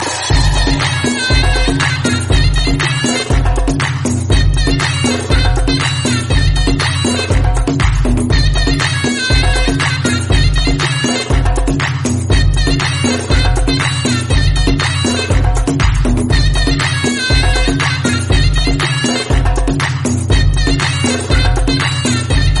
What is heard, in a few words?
turkish mix